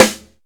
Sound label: shiny acoustic snare sample G sharp key; snare drum